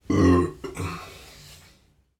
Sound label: Man Burping